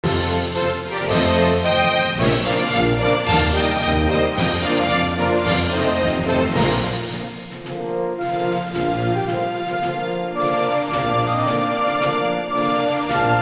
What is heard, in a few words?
Anthem Italy